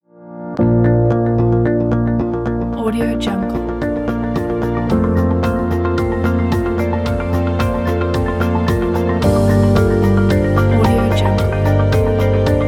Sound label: positive motivation logo; musichipster